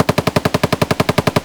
aksu far loop; Escape from Tarkov

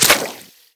water bullet impact; Rust

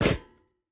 footstep rubber boots metal jump land; Rust

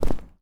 Personnel Footsteps Concrete; DCS

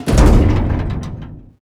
impact sheet metal medium

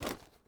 ui pickup tarp; Rust

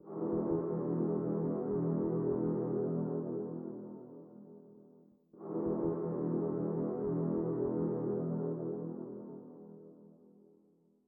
music interactive; World of Tanks

